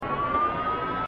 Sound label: launch